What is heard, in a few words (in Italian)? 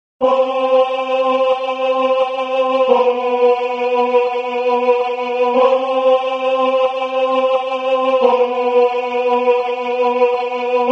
tenore; Dis Old Hammer